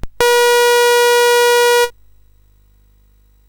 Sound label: Ryshka, sid CSG8580R5; GAMES